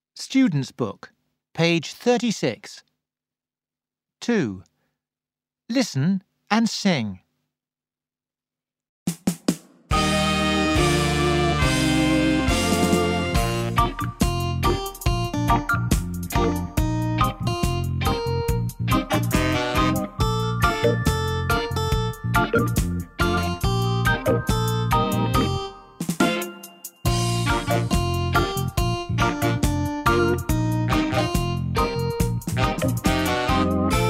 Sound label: Karaoke; Super Minds Level3